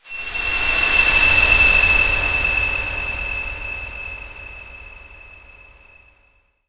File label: squeel